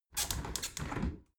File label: window open regular sequence